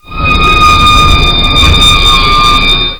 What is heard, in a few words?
Stop Break Snd